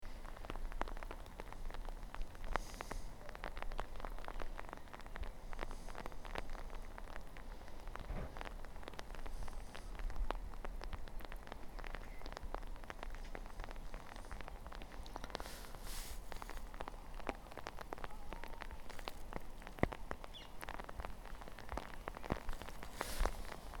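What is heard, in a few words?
rain on a jacket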